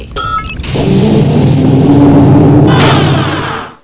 door open

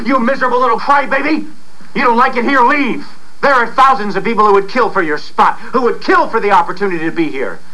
crybaby

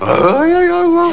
grunt

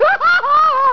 laughs

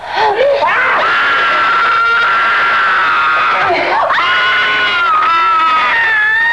Scream

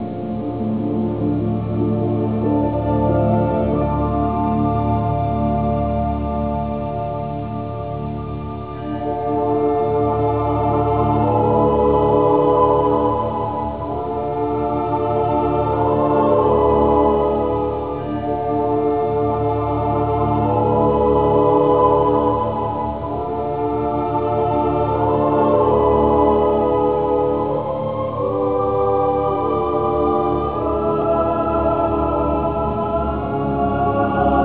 track loop 8bit 8k

